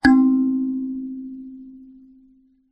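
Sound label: musical kalimba tine pluck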